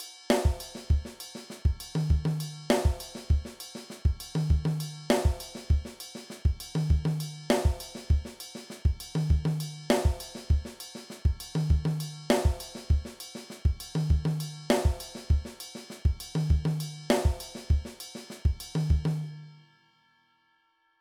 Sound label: Afro Cuban